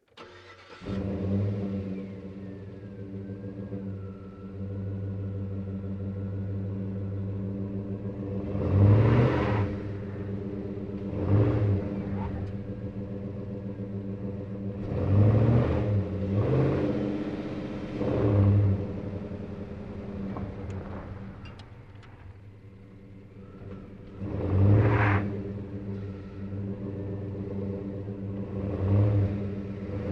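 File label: Truck GMC Savana Onboard 3 Point Turns Maneuvers Fast; Trucks; Big Room Sound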